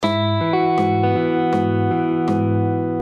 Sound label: Triplet of Quarter Notes